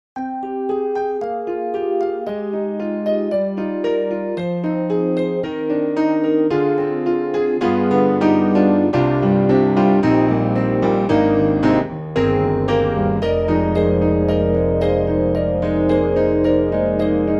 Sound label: Ballad Layer